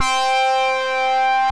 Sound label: solo, synth lead; 2 Sided Solo; Ableton; Factory Packs; Beat Tools